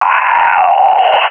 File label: balkan death; vo; balkan epic